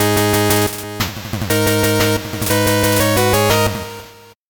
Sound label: 8bit jingle slow